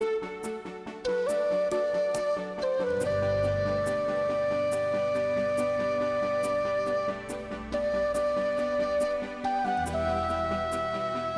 panfluit

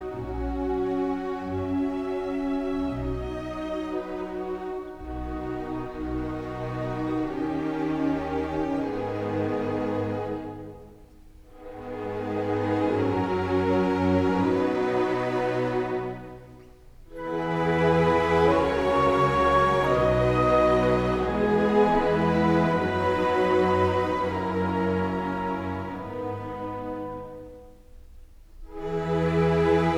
Pianoconcert e fl maj adag

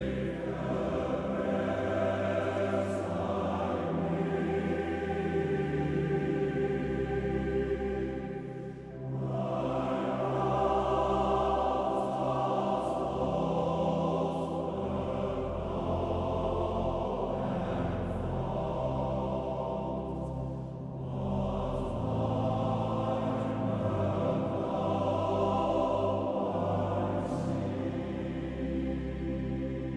Koor (mannen); Edoza

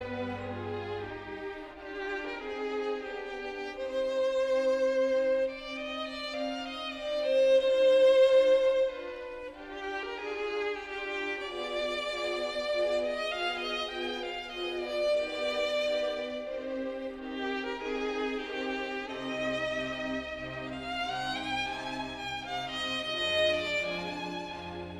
Andante, viool E flat major; Mozart; KV 364

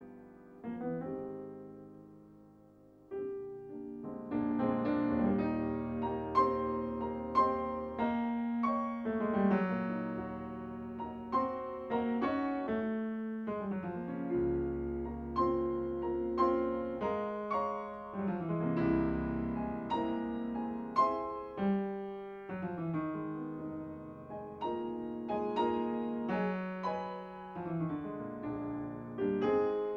Instrumentaal

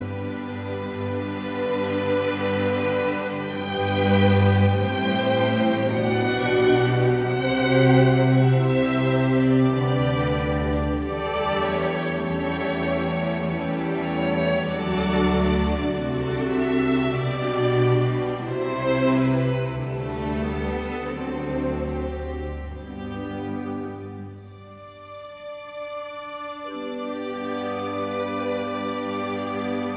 instrumentaal orkest

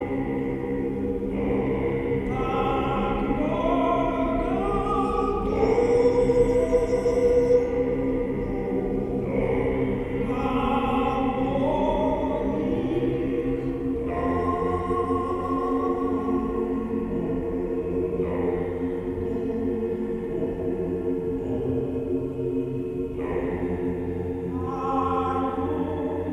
Koor mannen

